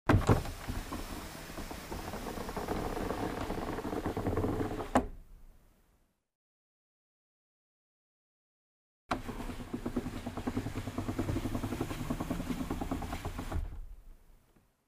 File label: Car glass lowered, raised manually; windows